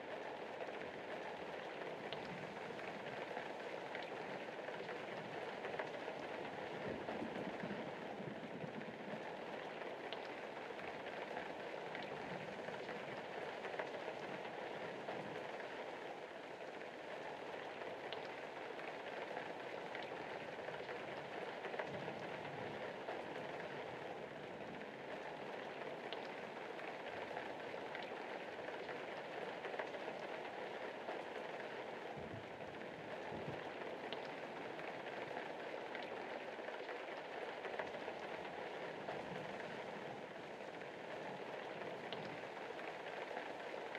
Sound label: indoor rain; Yars Rising